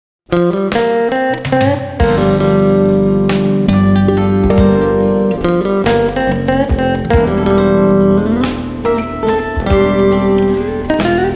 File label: blues contest